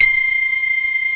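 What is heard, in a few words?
RICH BRASS C2 left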